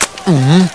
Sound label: mhmm